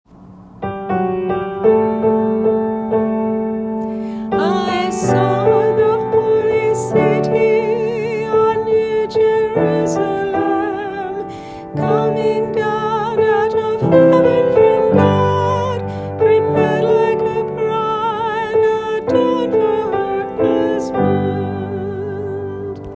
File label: Antiphon; Dedication Lateran Basilica